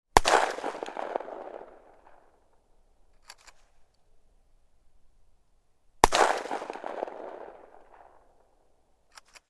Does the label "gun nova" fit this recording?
Yes